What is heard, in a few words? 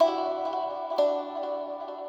synth Far Away 100bpm G